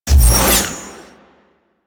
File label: Sword Whoosh; Warframe; Cephalon Weave; Weave Boss